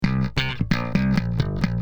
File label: bass